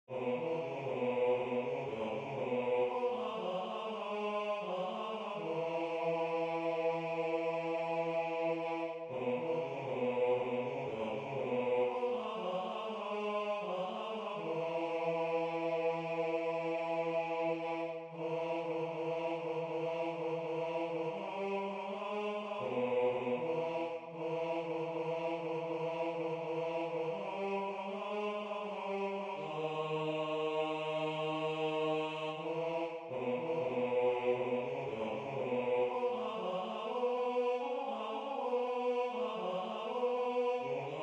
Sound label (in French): la belle ha c la ne basse